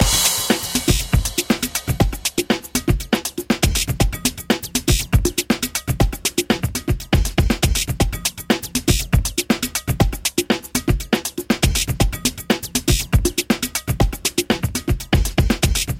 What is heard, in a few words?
Beat